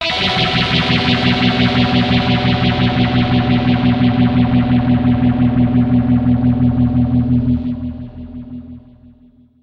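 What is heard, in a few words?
dirty guitar stereo polyphase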